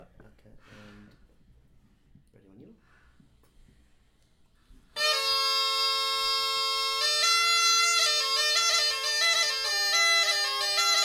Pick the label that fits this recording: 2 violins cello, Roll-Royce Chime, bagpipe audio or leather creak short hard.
bagpipe audio